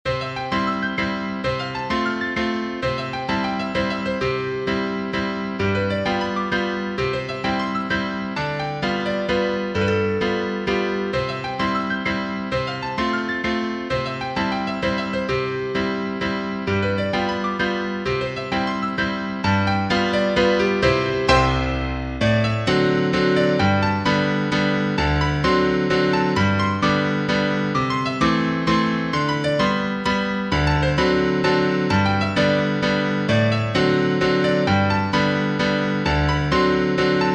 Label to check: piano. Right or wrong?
Right